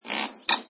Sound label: Tui croak tweet, Exotic bird - New Zealand Tui croaking, then chirping or tweeting.; (mono); Bird Sound Effects